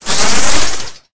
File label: Wind